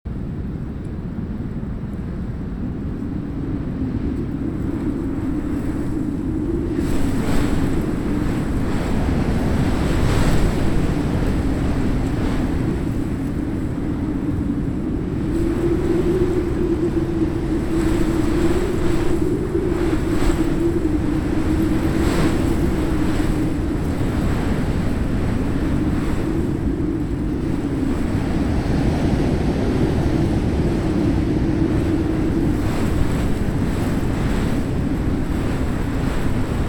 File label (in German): Wind, Dieser Ton entstand bei mittlerer Windstärke; Dieser Ton entstand bei mittlerer Windstärke und wurde an der Hochschule aufgenommen